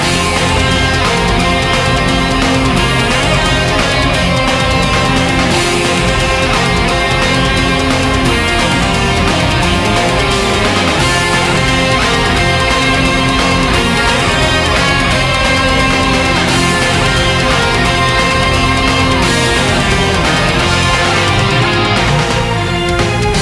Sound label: RPG Battle Metal Mix Loop; Granblue Fantasy Versus